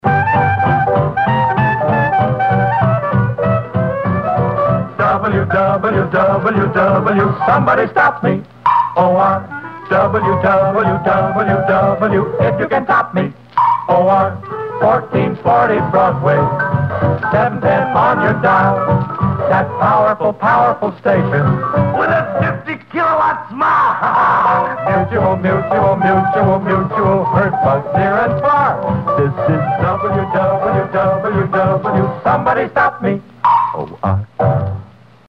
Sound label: Radio jingle; 1943; WOR Mutual